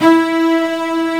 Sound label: E 3-CB S.H, C-BASS SECT; AKAI S6000 CD-ROM - Volume 1; Strings